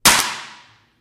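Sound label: physics clipboard impact large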